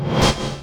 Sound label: natures guise off; treant